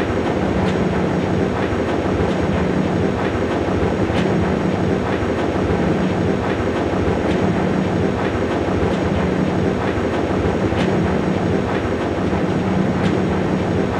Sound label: control rod; The Swapper